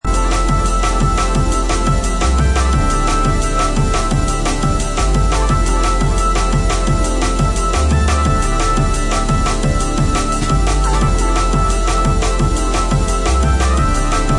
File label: Drum Bass